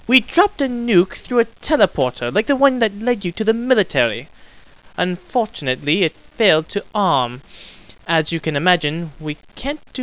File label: ahh, scientist